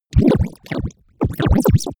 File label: robot defect droid